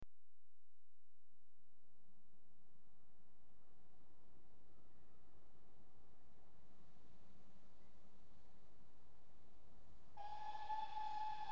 Train Passes High Speed Noise; BBC Sound Effects Library; Age Of Steam

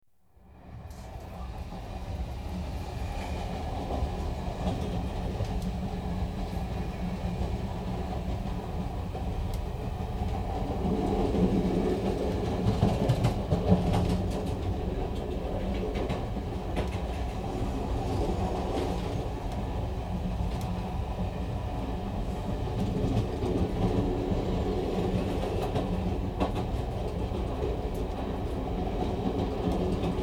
Diesel Trains Atmosphere; The BBC Sound Effects Library

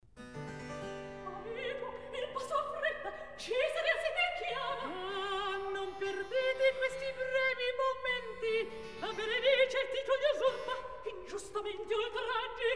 Recitativo; Amico Il Passo Affretta; Mozart; La Clemenza di Tito

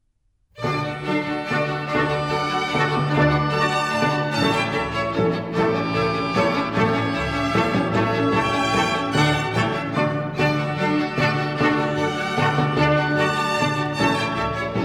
German Dances, D major; Mozart; Dances and Marches

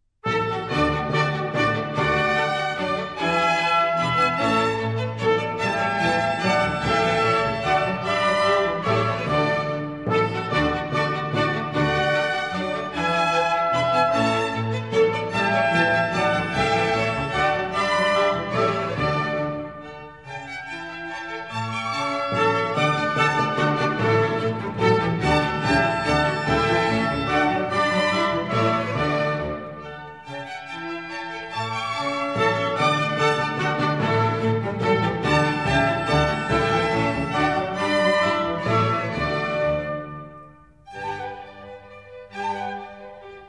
Minuets, D major; Mozart; Dances and Marches

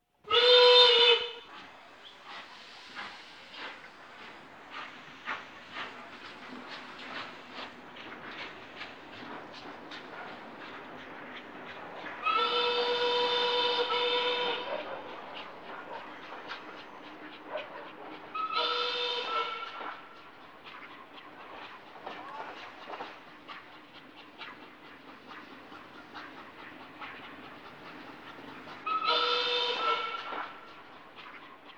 Steam Train Departs, Urban South America; Luque St; The BBC Sound Effects Library

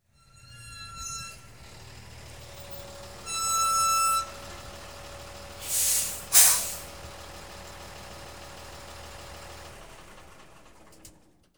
diesel ext approach, stop, off; Transport; BBC Sound Effects Library

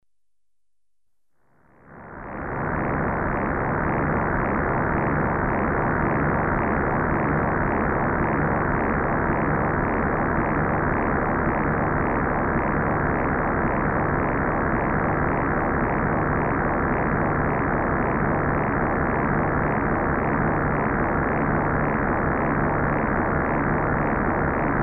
Machine buzz, Electronically Generated Sounds; Sound Effects Library

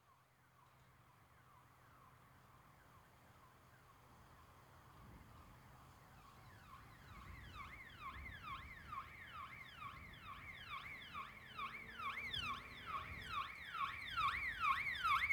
Police Car Arrive With Yelp Siren; Emergency; BBC Sound Effects Library

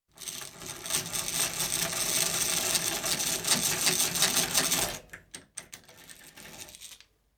Hand-Drill, Single Hole In Wood; Construction; BBC Sound Effects Library

